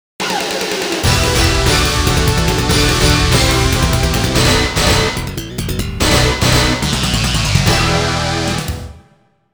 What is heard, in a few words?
Rotten Wings (GAME OVER); skull fang avengers in galactic storm; DEATH SCYTHE